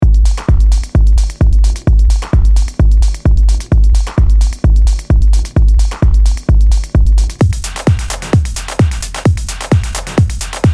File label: atmospheric techno